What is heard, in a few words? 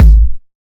tight low end bass drum sample g sharp key